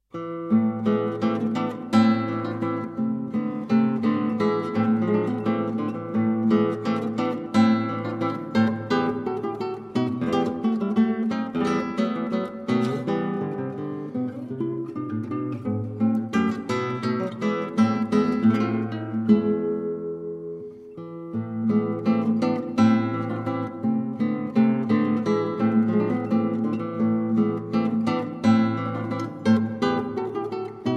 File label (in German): Lyrische Gitarr